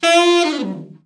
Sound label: BRA ALT FALL, SAX; Best Service Gigapack 1